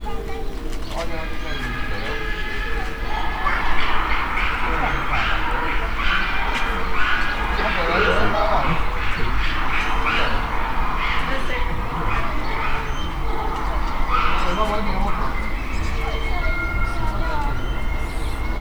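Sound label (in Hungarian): varjak távolról; nyiregyhazi zoo 2010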